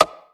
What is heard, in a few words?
hit ball